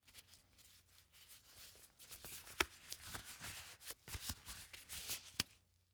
Emergency Gloves Latex Gloves Putting On; emergency effects; AdobeCC

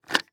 Technology Electronic Phone Pick Up Rotary

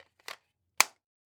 Weapon Gun Machine Gun 9mm Clip Insertion; AdobeCC

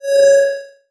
Multimedia Internet CD-Rom Flash Beep Electronic

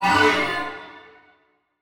Beep Long; Multimedia Internet CD-Rom Flash

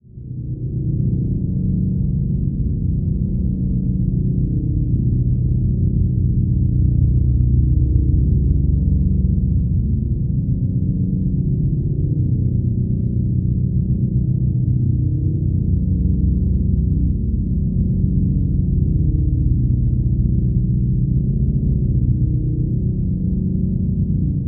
Drone Mellow Lush Rich Tone; Production Element Imaging; AdobeCC

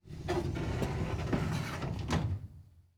Drawer Filing Cabinet Drawer Closing; household

